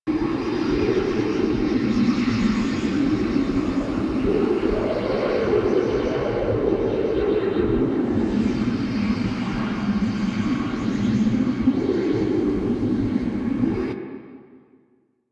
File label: Silence Loop; Warframe; Warframes; Decree